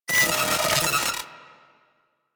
Cipher Fail, UI; Warframe

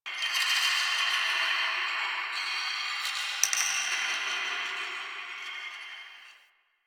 Misc Creepy, Ambience; Warframe; Entrati

